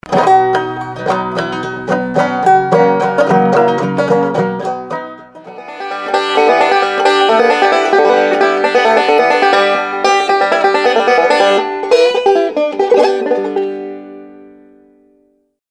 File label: Banjo